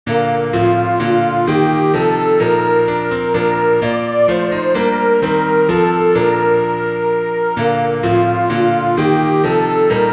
st theodulph soprano; by Melchior Teschner (1584-1635)

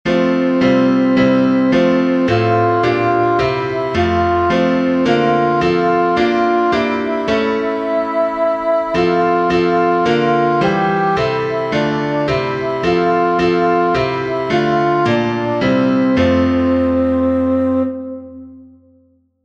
st flavian alto

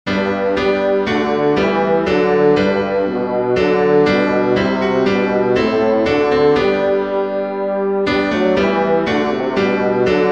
gott vater sei gepriesen bass; GOTT VATER SEI GEPRIESEN Limburg Gesangbuch, 1838; “O God, Almighty Father”

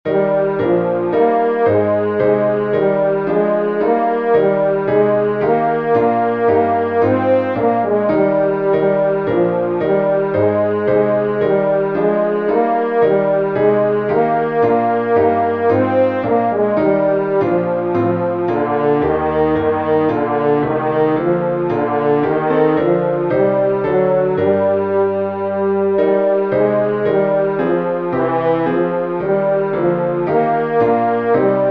now thank we all tenor; nun danket

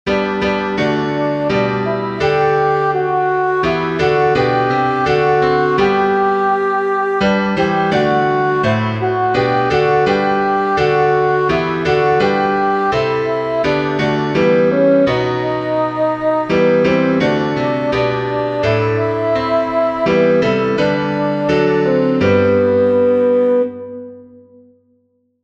alto; Jesus, Lead the Way

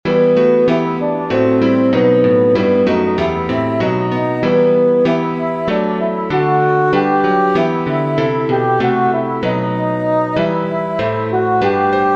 erhalt bach alto; erhalt uns herr by bach; Geistliche Lieder, 1543

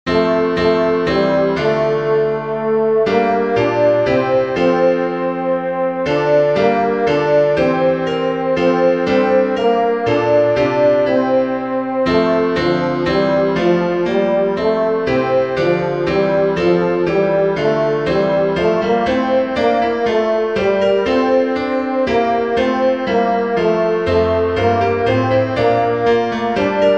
lambillotte tenor; LAMBILLOTTE “Come Holy Ghost”

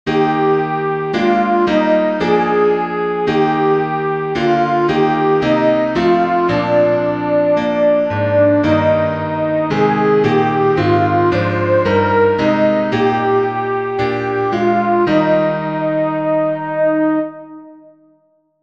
coena domini soprano; Draw Near and Take the Body of the Lord

